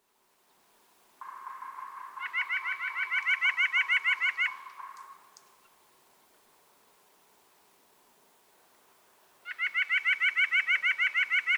White-breasted Nuthatch

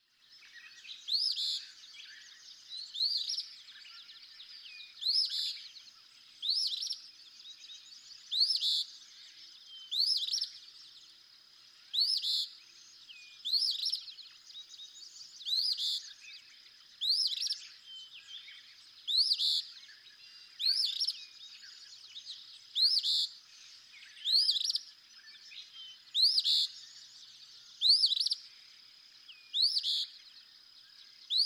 Eastern Phoebe

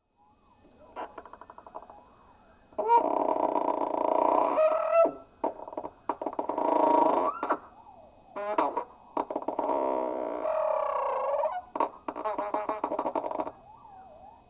Cliff Swallow; Songs at quarter speed; June 1, 2012. Graves Farm, Williamsburg, Massachusetts.

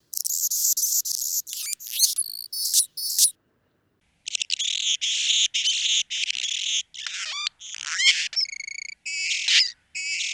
Anna's Hummingbird

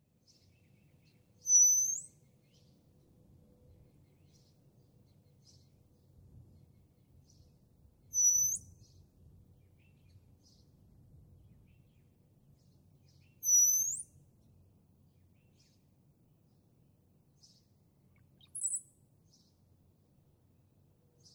Northern Mockingbird

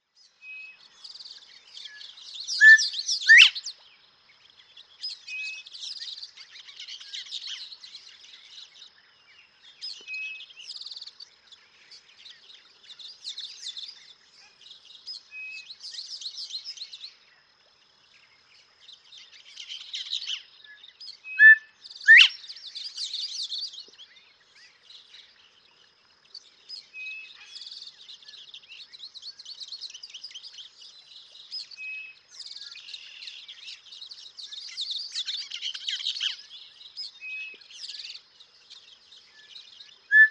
Northern Bobwhite

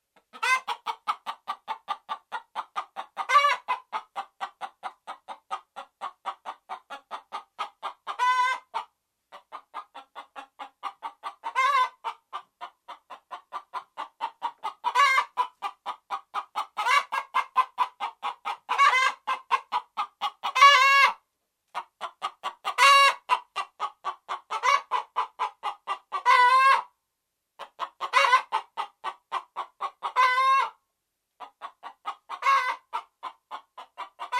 Red Junglefowl

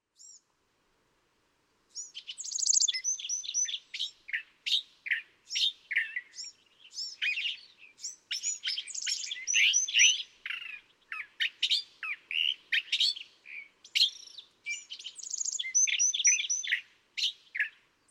California Thrasher

